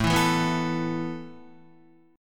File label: A Suspended 2nd A Guitar; Asus2 strummed